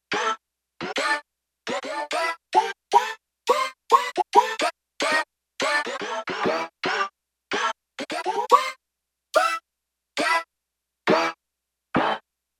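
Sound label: Short Japanese Flute; Digital Synthesizer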